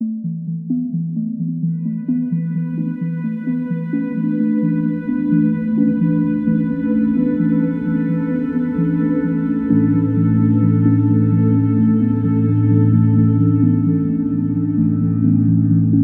Opening Stinger; Valley

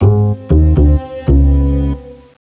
Music Lose

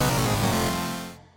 die; Hanger World; Rope Swing; android

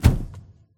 fall; RejectRoom

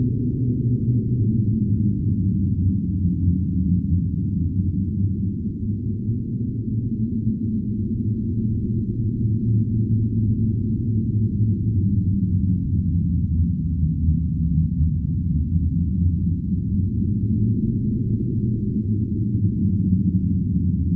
Ambience Gungan City; Angry Birds Star Wars 2

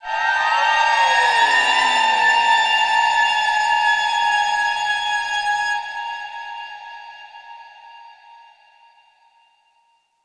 Sting; Stronghold

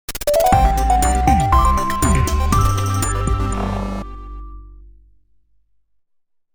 Fanfale; Cave Story

